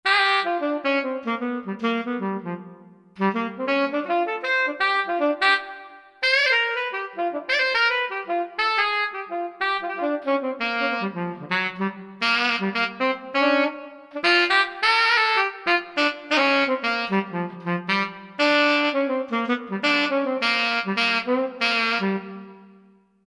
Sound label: Honky Tenor; Roland SRX-03 Studio SRX